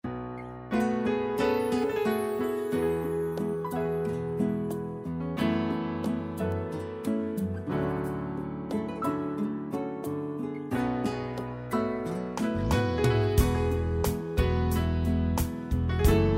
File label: Style Piano Ballad